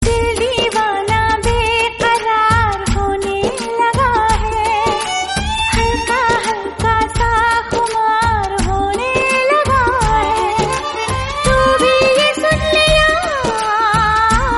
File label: Romantic Female Song